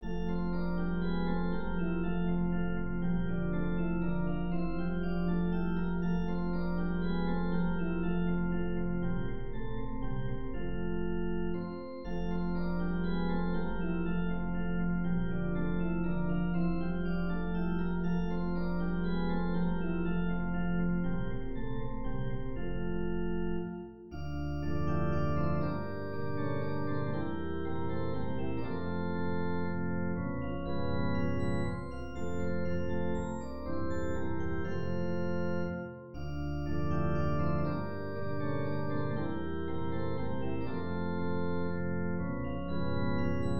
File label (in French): valse fa majeur; beethoven ludwig van